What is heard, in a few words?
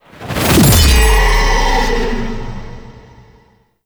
Ethereal Void Angel Beacon Cast; Abilities; Warframe; Zariman